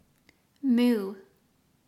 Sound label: moo